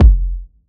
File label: Kick